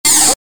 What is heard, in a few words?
servo agressive